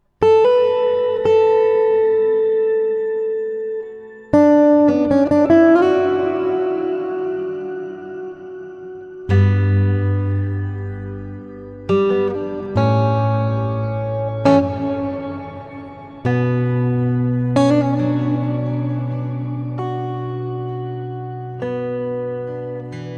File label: shimmer